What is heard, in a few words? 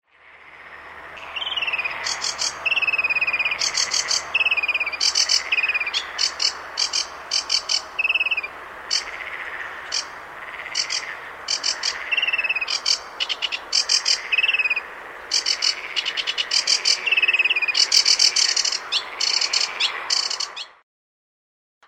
sedge warbler